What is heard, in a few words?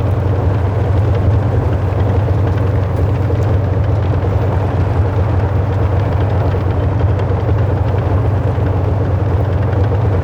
tank engine light loop; Hearts of Iron IV Death or Dishonor